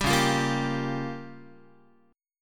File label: C sharp 6th Flat 5th C sharp Guitar; strummed; 10,9,8,8,11,x